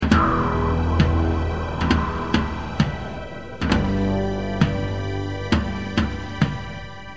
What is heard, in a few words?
ringtone